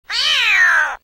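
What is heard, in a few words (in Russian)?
zvuki bronenostsa, armadillo sounds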